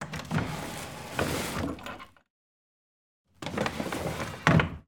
Wooden Drawers, Open, Close; lilesound

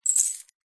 industrial chain small grab grasp hand

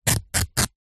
foley clothes cotton underpants rip tear

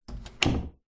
household door internal close medium

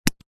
foley conifer cone drop land grass

